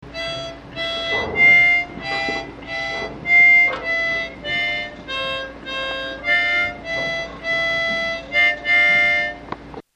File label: harmonica sample